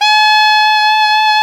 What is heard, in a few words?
SAX ALTO MP; Roland LCDP07 Super Sax